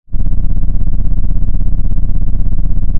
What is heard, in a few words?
pop, Sound of an engine revving